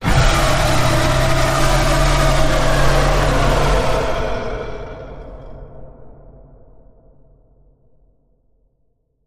Werewolf Howl Bend

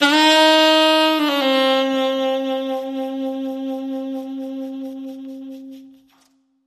Steamy Sax

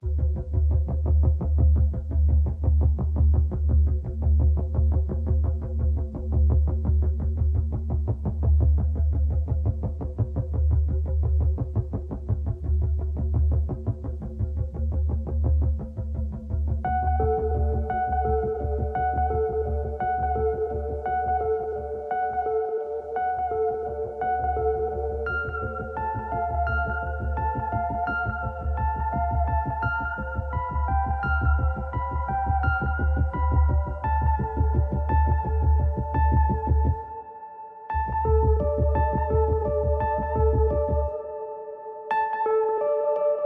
Pulse

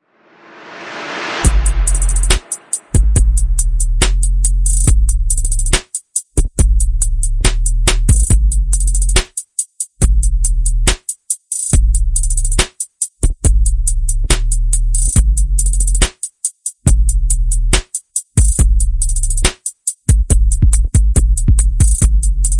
Perc and FX

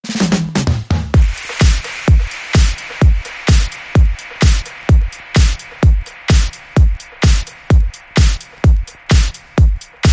WA Groove Beat

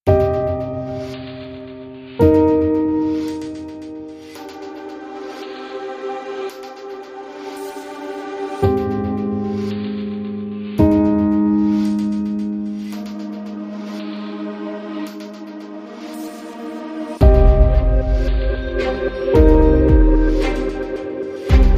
No Pulse Synth and Reversed Sounds

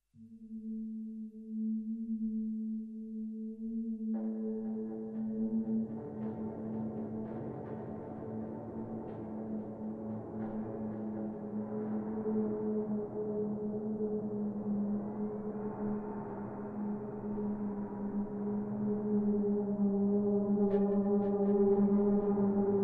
Drones

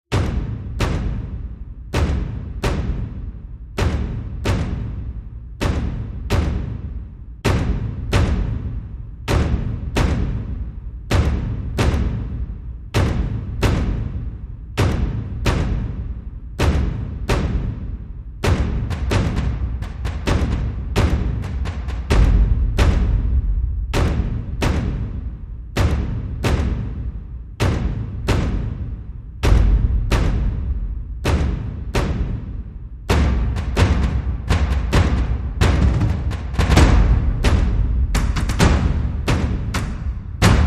Heavy Drums Only

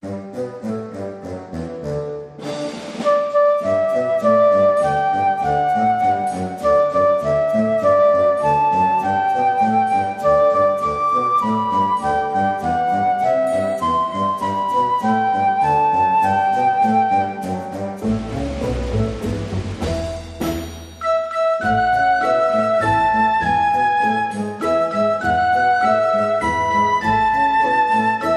Flute Happy Birthday

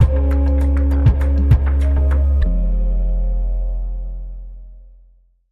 Bumper

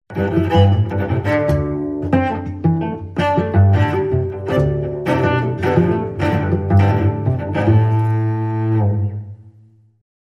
Cello Suite 2 Variation 3

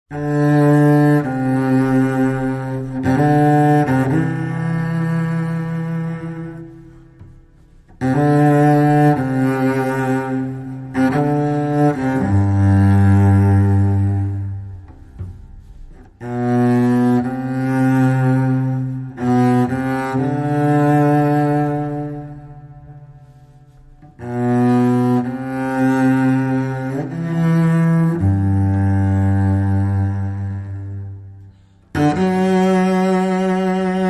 Cello Only

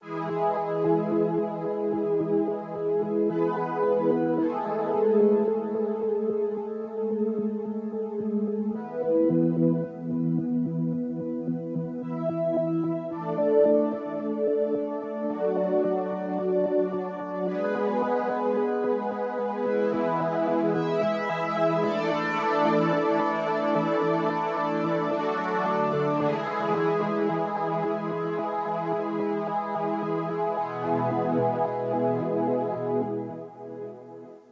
Analogix, Sequence

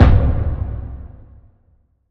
Indie Acoustic Hit

